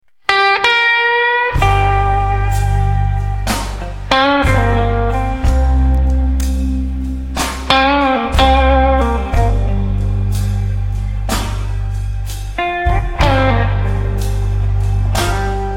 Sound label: Rainy Day Blues; loneliness